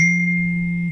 vibration ding phone

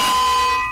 minecraft anvil

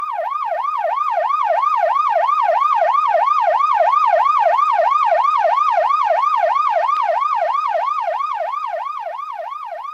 police siren

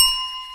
hotel service desk bell

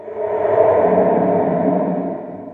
cave sound; minecraft

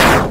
strike sound; undertale

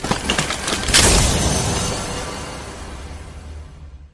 chest opening

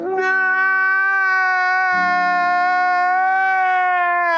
crying no

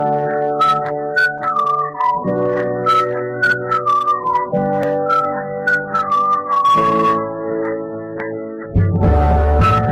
happy kid song

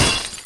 engineer wrench; tfs

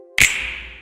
click sound; library of ruina

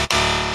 incorrect sound effect

